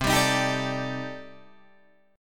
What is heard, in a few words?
C Minor 6th Add 9th C Guitar Open D